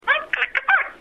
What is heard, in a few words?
fart; napoleon